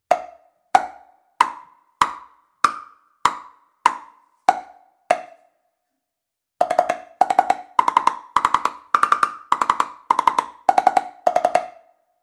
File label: temple blocks